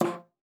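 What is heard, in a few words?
F3, bass flute; Xsample VOL-2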